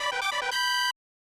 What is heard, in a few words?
Level Up; Kaizou Tyoujin Shubibinman Zero; SNES